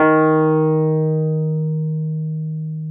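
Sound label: Wurlitzer electric piano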